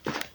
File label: foot steps; Walking; fmod-moloch